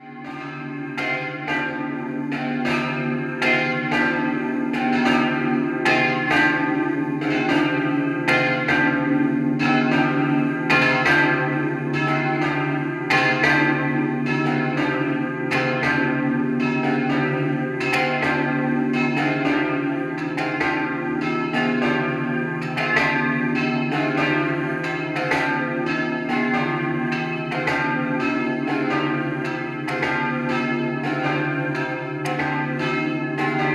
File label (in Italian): campane; Fagagna